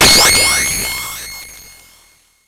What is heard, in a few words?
Cwejman S1 - Freq Shifter